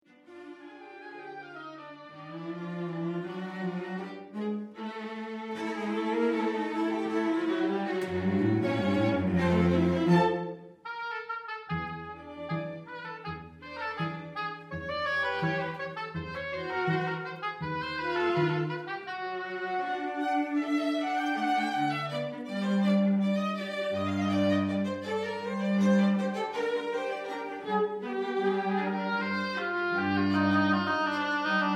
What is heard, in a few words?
Basse Danse